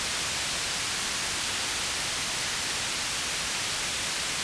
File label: noise beat; Samples Rauschen